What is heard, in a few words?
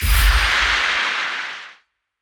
gambler lose; Great Book Of Magic Deluxe; Wazdan